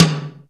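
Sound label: Roland R-8 hollow tom; Power Drums U.S.A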